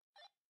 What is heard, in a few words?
walkie talkie toggle; norden-sounds